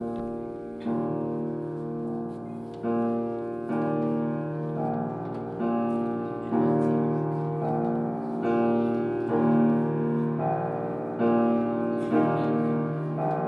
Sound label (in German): Domglocken in der Weihnacht